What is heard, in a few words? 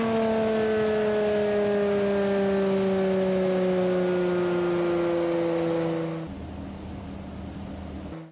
slowdown slow, vehicles cars; Audi r18; lwcars